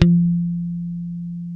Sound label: F 4, ultra pick, bass; Spectrasonics; Bass Legends CD 1